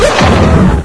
houndeye blast; source engine; hl1; video games

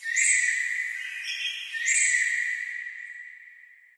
amb african birds; video games; ambient; nature; source engine; portal2

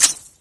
button click release; ui; source engine; dod

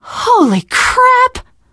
violence awe, teen girl, survivor voice; source engine; left 4 dead 2

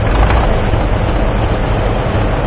crane slow to idle loop, vehicles; Source Engine; video game

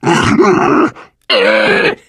charger lurk, voice idle; source engine; left 4 dead 2

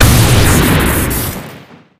diamond back crit, weapons; tf

